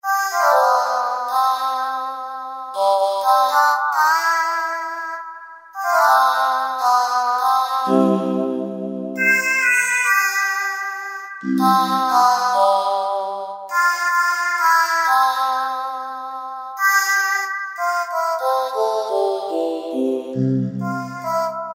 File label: Vel Synth Wave, Kurzweil K2000; SYNTHS; Kurzweil K2000 Value Pack; Extra Programs